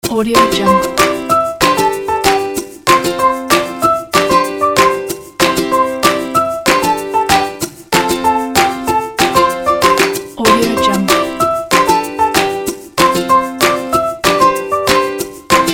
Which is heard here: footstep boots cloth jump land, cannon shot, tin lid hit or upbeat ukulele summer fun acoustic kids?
upbeat ukulele summer fun acoustic kids